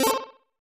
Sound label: Coin; Hisshou 777 Fighter II; SNES